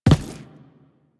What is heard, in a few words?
Footstep Default